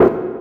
step, sfx; rust

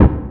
hit, sfx; timber game

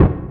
hit, sfx; timber

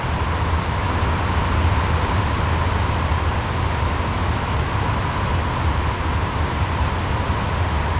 room tone; prism

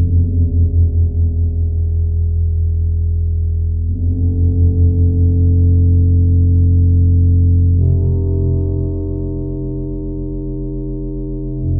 stem bass, music loop; anvil

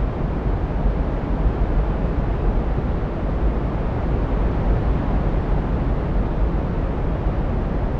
room tone; vellum

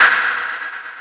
step, sfx; anvil

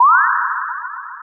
pickup, sfx; prism

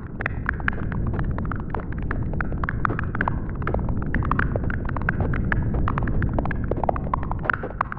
fire, ambience; timber

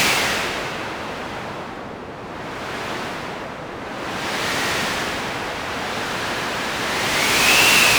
wind, ambience; prism

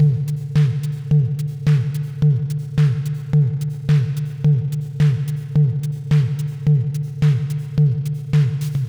stem drums, music loop; rust

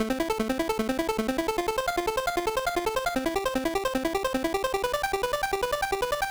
stem chords, music loop; arcade

